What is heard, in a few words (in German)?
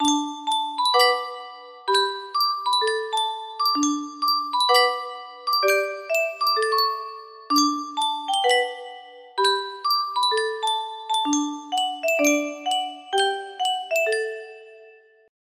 yunsheng spieluhr, music box melody